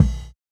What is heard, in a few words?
Kick, jungle breaks drum sounds